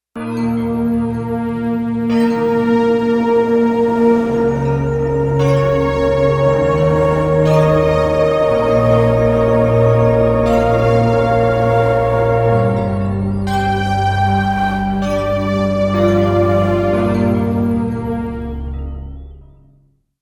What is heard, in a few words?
FX Stack; Digital Synthesizer